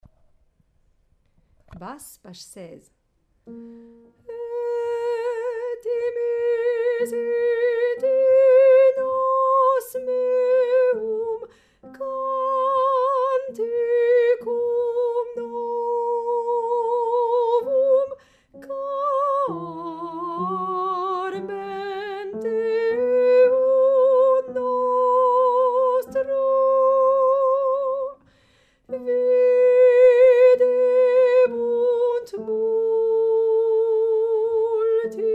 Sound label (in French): psaume Basse